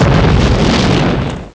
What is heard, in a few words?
EXPLOS